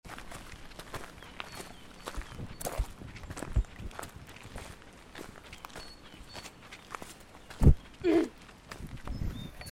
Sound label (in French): AMBIANCE MARCHE